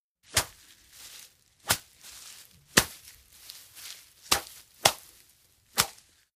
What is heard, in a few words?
Machete hacking through jungle, faster, no birds